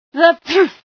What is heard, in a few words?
sneeze, female